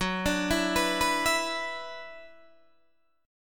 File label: F sharp 7th Suspended 4th Sharp 5th, down, Guitar, Open D